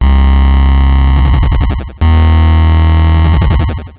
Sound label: Sh09 Bass Loop, 120BPM; musicradar; bitcrushed; Crushed Loops